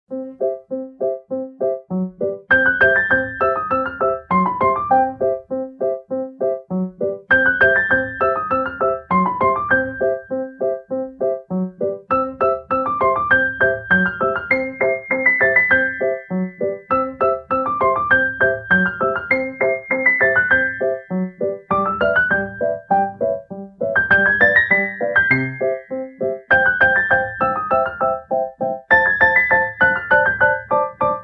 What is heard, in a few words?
mini dessert loop